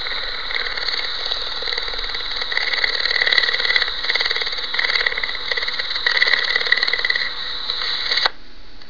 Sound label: Cougar Pur; Cougar Purr